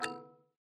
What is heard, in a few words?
click